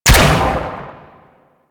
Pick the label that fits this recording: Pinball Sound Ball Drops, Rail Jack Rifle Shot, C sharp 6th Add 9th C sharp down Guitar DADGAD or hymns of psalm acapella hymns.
Rail Jack Rifle Shot